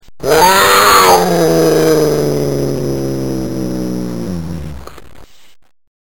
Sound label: cat